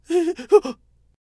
Cactus Attack Vocals

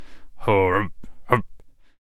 Miner Attack Vocals

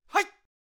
Taekwondo Attack Vocals

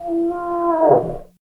Mammoth Death Vocals

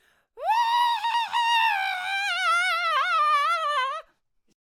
Attack Vocals

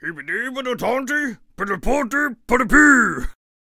King Attack Vocals

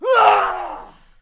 Clubber Attack Vocals

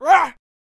Farmer Attack Vocals

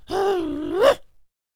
Void Cultist Attack Vocals

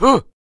Stoner Attack Vocals

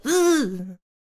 Blowdarter Death Vocals

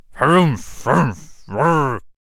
Miner Attack Vocals

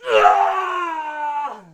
Clubber Attack Vocals

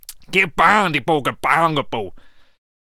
Gunslinger Attack Vocals

